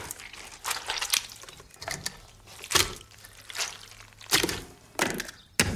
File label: Squelch Blood Stab